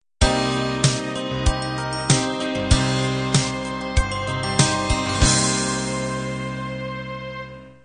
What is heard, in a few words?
diva end